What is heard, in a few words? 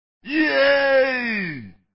yay